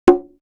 Perc; Coldcut's Kleptomania VOL1

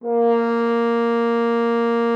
FR HORN A#3; Xsample VOL-3

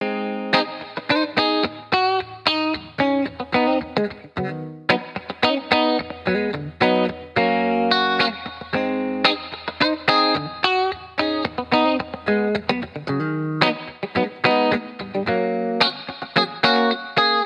Guitar Bpm110 Key G#, 70s Guitars; Fox Samples - Back to the 70s Guitars

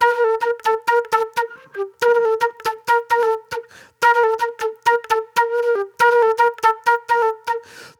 Fast Funk Flute

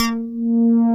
PPG 2.3; DIGITAL1 HYB

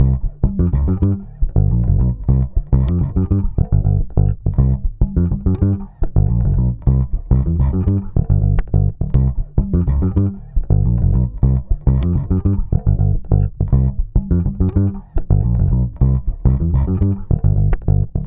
105 Cmaj SUGARFUNK BASS; 105 BPM

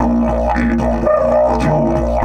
108, Didgeridoo

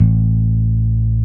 Basses, Roland

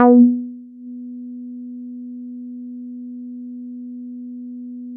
Synths BASS

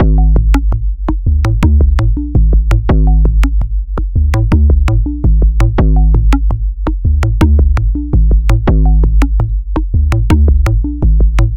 Synthetix, Processed Percussion

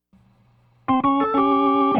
Organ, 70s Analog Funk

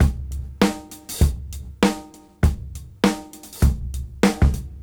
Swing 98 BPM; AMG; Keith LeBlanc Phat Butt Jams

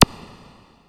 Tick Perc, Ensoniq ASR-X Pro

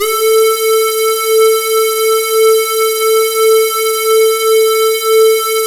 SAW, Synth and Organ; InVision Interactive; Keith Emerson; The Most Dangerous Synth and Organ

